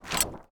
Attack Foley; Portal Quest; android; SFX Rebel Attack Foley

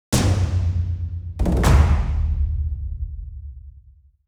Sting drums; Bakugan Champions of Vestroia; switch gamerip

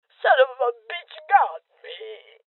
Voices Injury Female Old

